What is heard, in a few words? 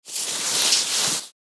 Polyester movement scraping; foley